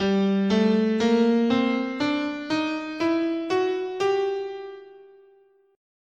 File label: G Bebop Melodic Minor, scale